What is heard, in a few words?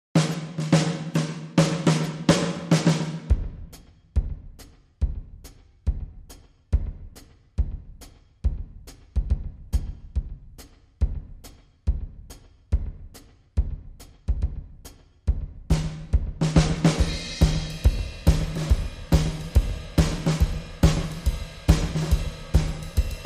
Drums